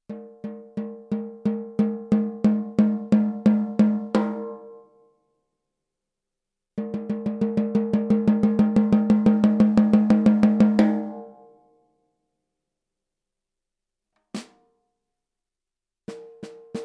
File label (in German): Cherry Snare Recordings Mittel